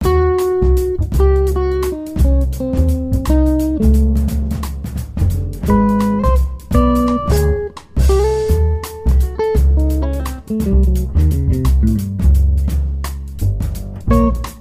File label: Blues in C; Art of the Blues